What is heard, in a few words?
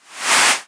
Box Move